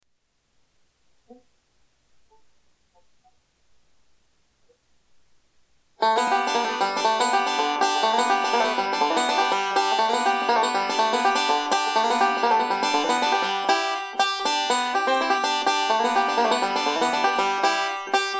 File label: banjo